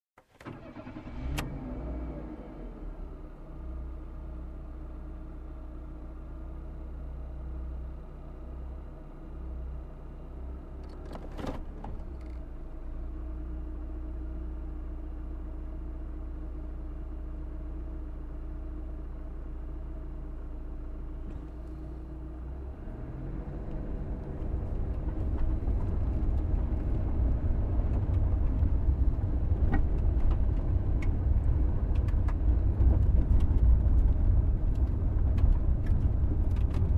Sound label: Ride in car on dirt road